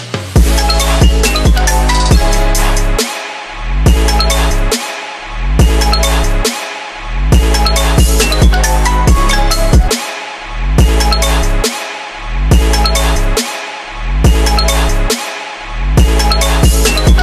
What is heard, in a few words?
iphone ringtone